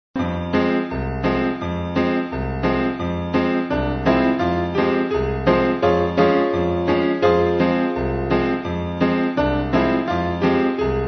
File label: Aeolian Piano Roll